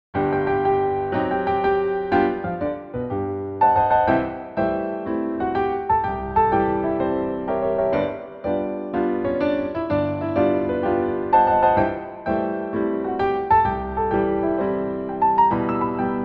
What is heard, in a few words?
Grands Battements 4/4